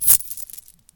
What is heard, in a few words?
Bag Coins